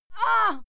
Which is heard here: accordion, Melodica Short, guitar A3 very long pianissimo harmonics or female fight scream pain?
female fight scream pain